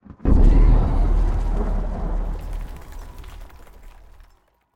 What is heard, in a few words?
thunder big; targon